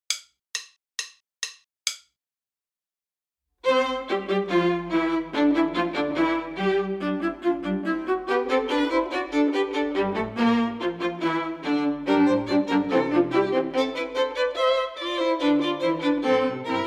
minus Cello

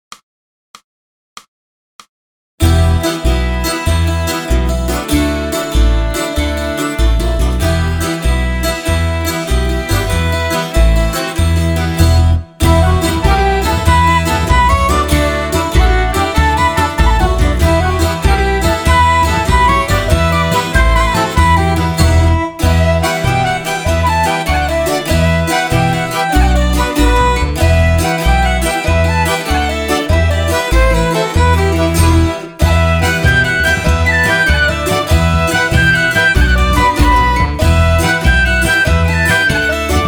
backing track

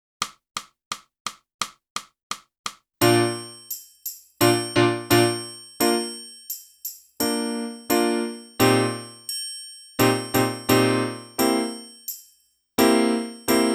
sax

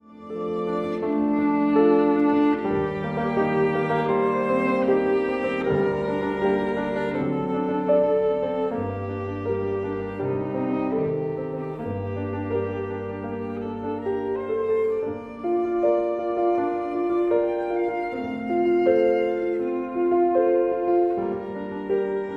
VIOLIN; Trinity College London violin exams for 2016-2019; Dvorák: No. 1 from Four Romantic Pieces op. 75

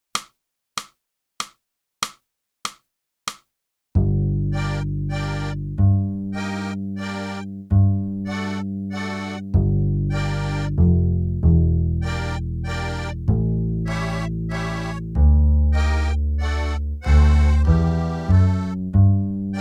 clarinet

